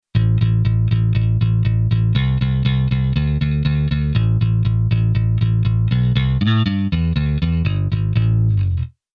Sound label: Bass Arr Rock Bass